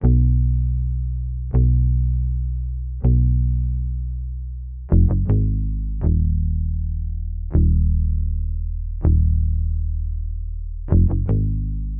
80 BPM D# Min Bass; Lofi; Melodies; Loop Stems; Cymatics; Lofi Starter Pack; Dreamscape

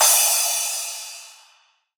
Crash; Cymatics; House Starter Pack; Cymbals

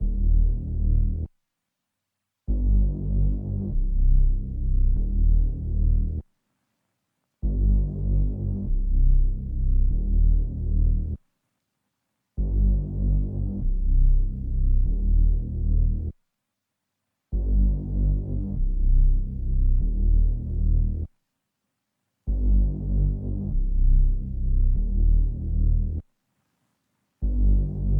Bass, 97 BPM G Min; Desires

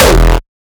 kick oneshot monster E; GhostHack

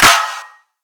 Clap, Dubstep

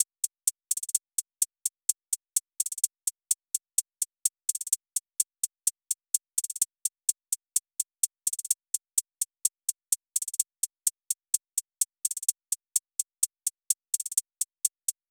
Drum Loop, 127 BPM Hihat; Loop Stems; Cymatics; Vibes II Drum Loop Collection; Matriarch Drum Loop

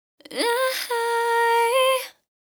Ad Lib Dry, 140 BPM G Min, Vocal; Cymatics; Euphoria Vocal Sample Pack; Ad Libs

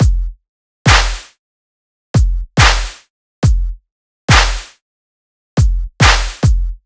Kick & Clap 140BPM, Dubstep; Loops; Drums; Ghosthack; Ghosthack - AC2024 - Day 13 - Dubstep Sounds 2025

